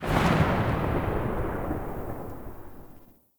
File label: Concrete Archgun Tail; Warframe; Archwing